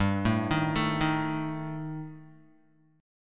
G Suspended 2nd Sharp 5th, Guitar; Gsus2#5 arpeggiated; JGuitar

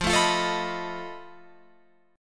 F 7th Flat 5th F down Guitar Open F; F7b5; strummed

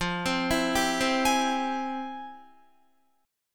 F Minor Major 9th, Guitar, chord; FmM9; arpeggiated

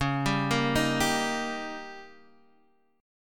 D sharp Major; arpeggiated; x,4,5,3,4,3; C#; JGuitar

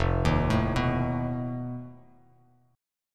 F 7th Flat 5th F Bass Standard; F7b5 arpeggiated; 1,6,7,4

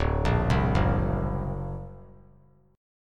D Augmented 7th D down; D+7 arpeggiated; JGuitar

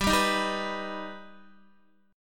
G Augmented G Guitar; G+ strummed; x,10,7,8,7,7; JGuitar

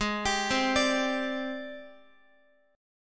G sharp Major Sharp 11th, Guitar; G#M#11 Chord

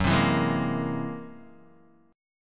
Gb 9th Flat 5th Gb down Guitar DADGAD, chord

